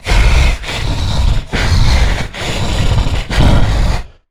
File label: Stealth Breath Low Health; Evolve; Goliath